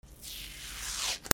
RIPPING

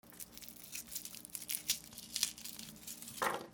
KITCHEN

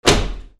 CARS

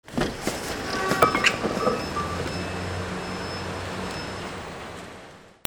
AMBIENCE

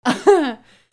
PEOPLE

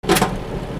INDUSTRY

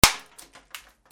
BREAKING

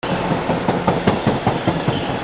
TRAINS

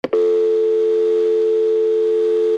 PHONES

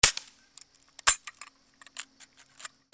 WEAPONS

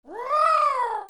CARTOON

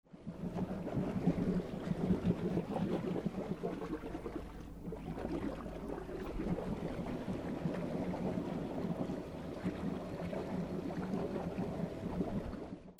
BOATS

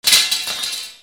BANGS

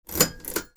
CLICKS

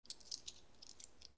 MONEY

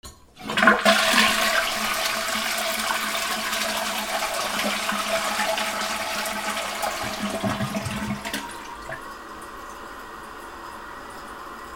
BATHROOM

